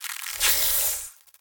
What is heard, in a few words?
alerted, Flying Insects